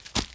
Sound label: footstep run vegetation